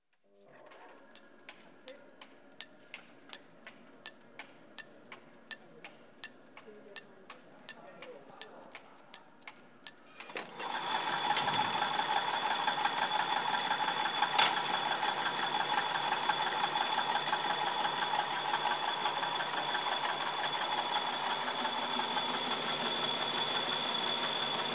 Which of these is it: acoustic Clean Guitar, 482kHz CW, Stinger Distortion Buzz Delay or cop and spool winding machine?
cop and spool winding machine